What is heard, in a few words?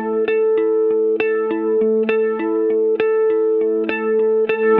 Cigar Flange 100 A, guitar drone